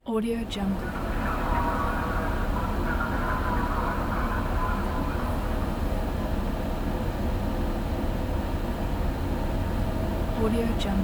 inside passenger train with open window while parked at railw; railway station